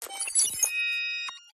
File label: USER INTERFACE COMPLEX; PMSFX